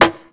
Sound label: Thick Snare Sample E Key, acoustic snare sound tuned to the E note